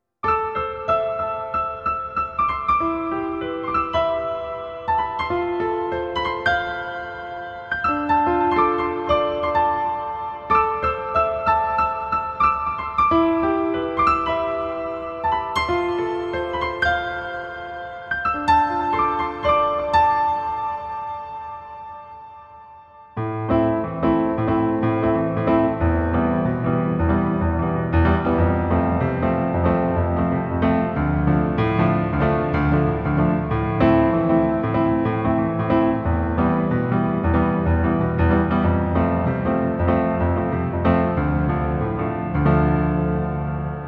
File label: piano play